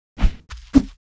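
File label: ATTACK, Monster; Champion; 야수맹; 홍작후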